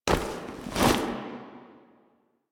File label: Grab Mask Pull Foley; Cinematics; Teshin; Warframe; The New War; Throne Room